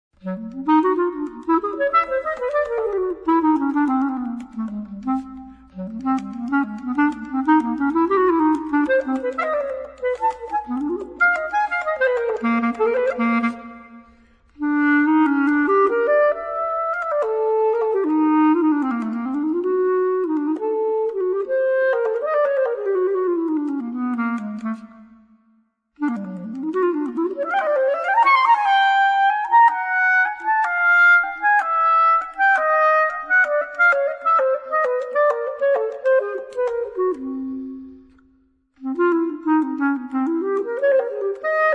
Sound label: jazz studies